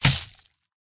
worm attack contact bottom

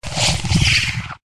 Air Spirit

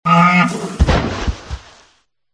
rhino death

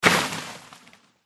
tree attack contact bottom

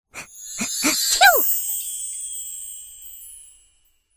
valentine pet buff; pet drakonchik feer

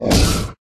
troll block

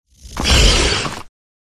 Scolopendra

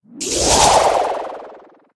polar bear

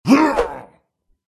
mage attack voice up

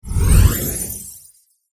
snake buff; pet

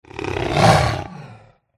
megavolk attack voice up

